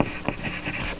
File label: Scribbling sound (metaphoric sound); Editor Pane